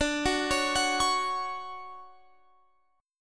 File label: D Major 7th, Guitar, Open F; DM7 arpeggiated